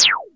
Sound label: NOIZ SWEEP